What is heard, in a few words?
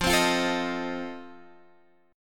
F 7th F down; strummed